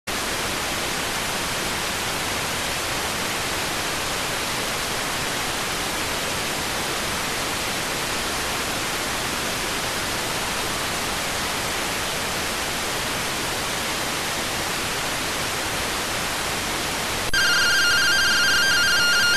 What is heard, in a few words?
rtty rx